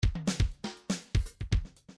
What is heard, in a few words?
grooves funk